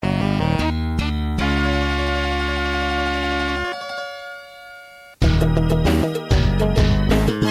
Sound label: blank sting; The Second Blank Sting